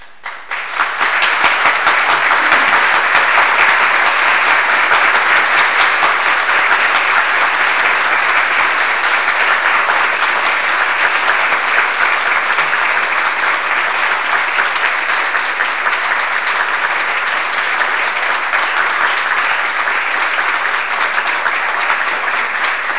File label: applause